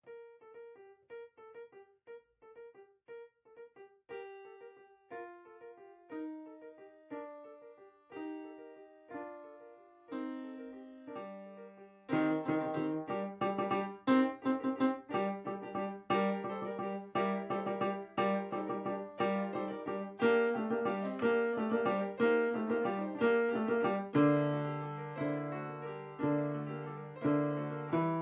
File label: bells bass